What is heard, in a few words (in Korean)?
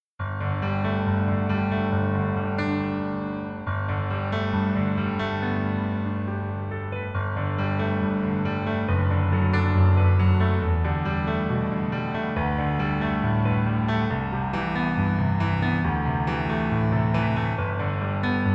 Piano ver, 멜로디MR; 낭만닥터 김사부